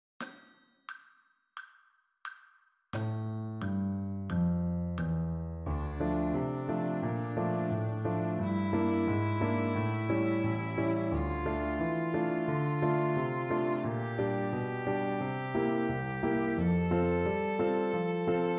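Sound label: D melodic minor scale, fiddle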